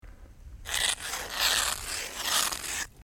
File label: Ice Scrape Ice Scrape